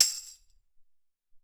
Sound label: Tamb Mute